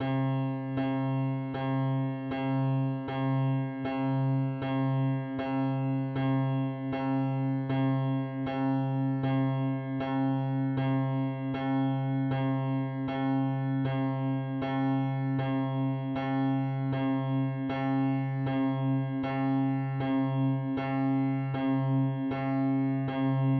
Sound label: Cents scale